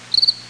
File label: Single cricket chirp